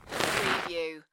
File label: Snow and Ice, Slide on snow; Weather sound effects